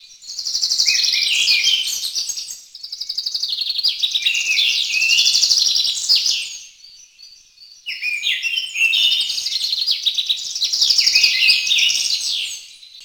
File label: Birds